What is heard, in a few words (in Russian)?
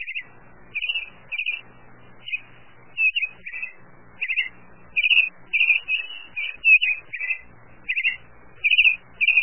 sladkogolosoe penie